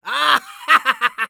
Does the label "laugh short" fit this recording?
Yes